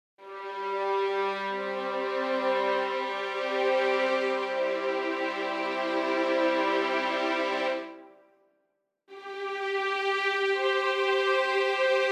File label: String Ensemble